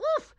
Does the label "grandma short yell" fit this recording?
Yes